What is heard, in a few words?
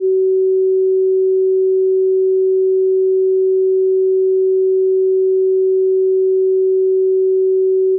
trap loop